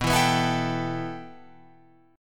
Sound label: B 7th Suspended 4th Sharp 5th, Guitar, Open F; B7sus4#5; strummed; JGuitar